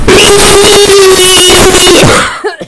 jumpscare sound; six horrors 4 canceled special

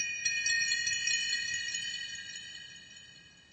minikit jingle; lego star wars

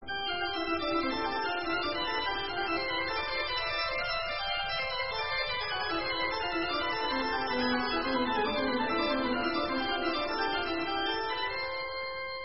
toccata and fugue coda

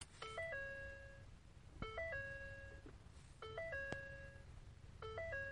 ford f150 chime

